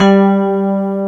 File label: DPF G2, ELECTRIC PF, Piano; ELECTRIC PF#1